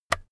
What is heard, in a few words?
keyboard external key release